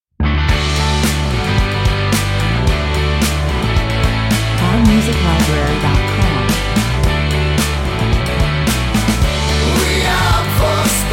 Guitar based alternative rock song with catchy vocals. Electrifying, juvenile, 110 bpm